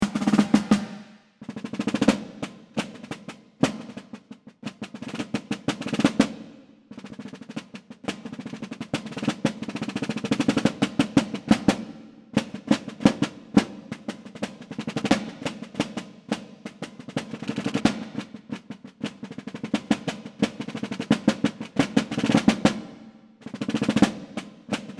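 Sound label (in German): Trommeln; nur Fyygeblatt Drumle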